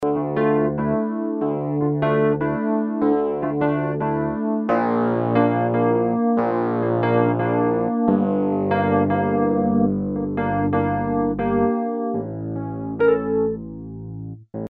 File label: numaconcert rhodes chorus